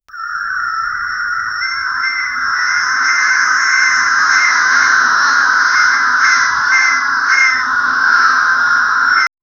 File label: normal and terrible sound, bird